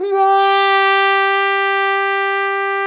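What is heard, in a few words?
brass plunger trumpet, plunger mute; Plunger & Wa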